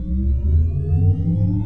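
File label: ship transfer power start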